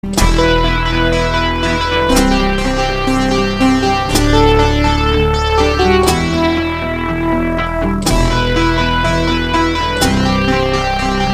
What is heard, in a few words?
keys seagull venom